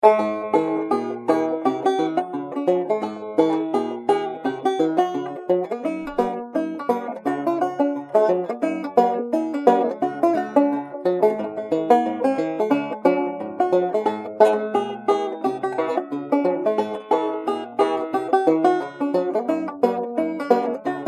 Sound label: funky, banjo